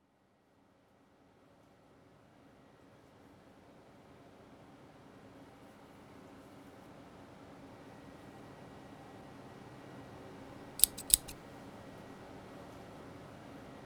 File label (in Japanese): メイドさんに髪を整えてもらう, ASMR; ASMRver